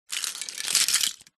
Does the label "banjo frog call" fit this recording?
No